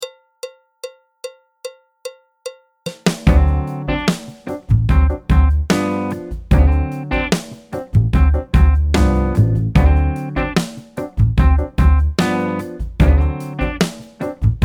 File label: piano; Jazz Syllabus Level 3